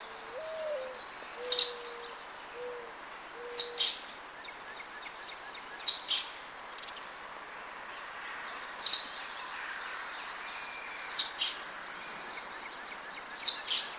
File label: bird